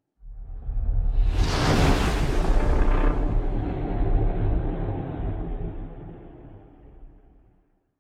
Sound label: ship colossus move; Stellaris; machineage